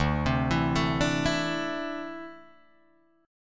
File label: D 7th Suspended 2nd, Guitar, Drop D